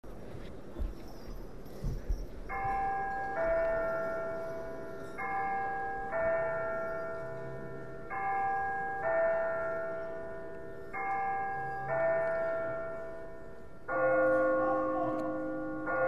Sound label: morgestraich drummeli; fasnachts